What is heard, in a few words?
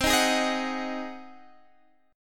Ab 7th C